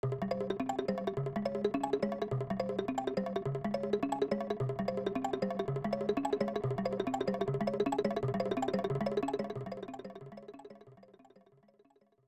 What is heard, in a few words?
P-Switch (Cave Hurry); super mario world snes